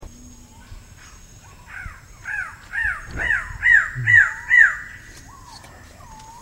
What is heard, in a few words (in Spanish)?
ave del paraiso republicana sonidos